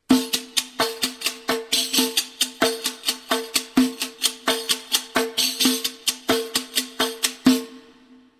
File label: riq